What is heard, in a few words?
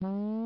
Kart Jump; pac man kart rally java